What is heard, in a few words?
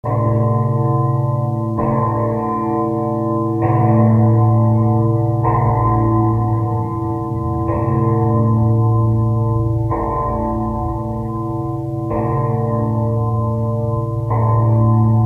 interactive sound bell